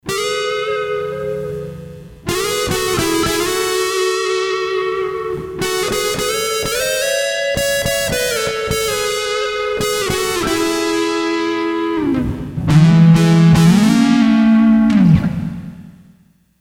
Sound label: Emaj 3rd Up; Sony HR-GP5